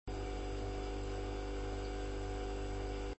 icecream pour; papa s freezeria gamerip

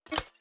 transition unable

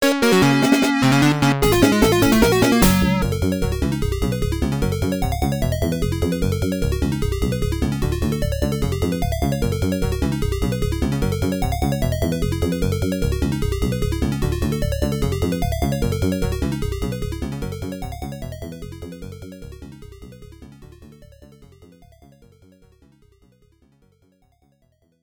Scene Clear ~ High Score; Daffy Duck in Hollywood; Genesis

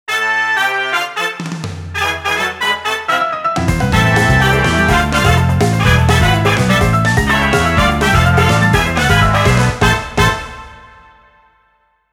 BGM Rhythmical

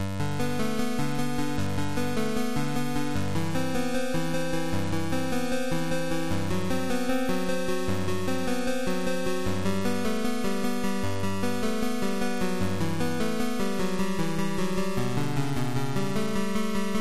BWV 1007 Prelude; Clickpocalypse II; android

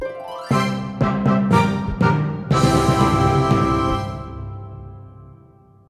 Quest completed

